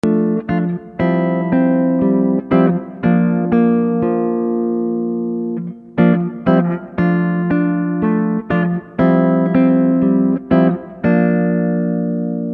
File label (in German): Unterschied Dur und Moll